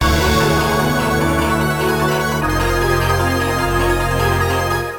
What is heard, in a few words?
BGM STAGE BATTLE; Phantasy Star Zero